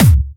Bassdrums Clubby, Kicks; Vengeance; Essential Clubsounds Vol.2

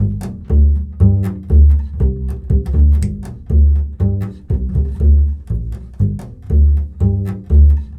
Bull Fiddle, Appalachian

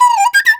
CHINA, Heart of Asia; Spectrasonics; CHINAFLT

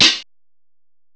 HH CLOSE, CYMBALS; M8; CMI Factory

